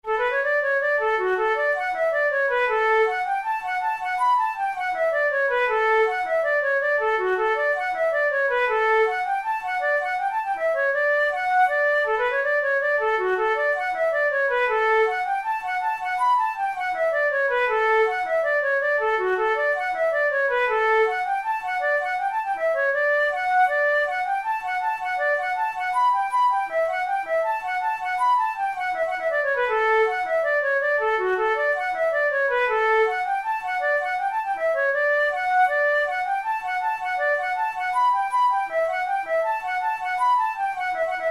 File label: hornpipe, flute